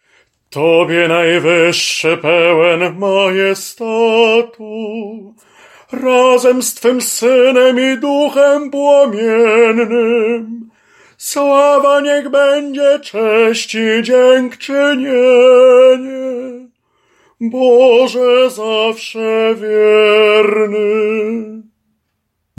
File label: SOPRAN